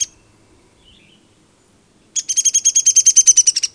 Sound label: Woodpeck, Birds, Coastal Louisiana; Explore Coastal Louisiana with Boudreaux